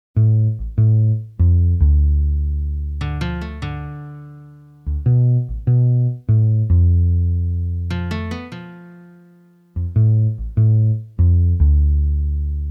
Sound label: Bass & Guitar Session; Legend of Zelda Majora's Mask